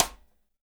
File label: SIDE STICK, STICKS